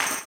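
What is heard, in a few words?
tambourine, 160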